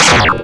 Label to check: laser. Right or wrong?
Right